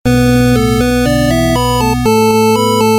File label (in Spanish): sonido 8 bit; ummm helado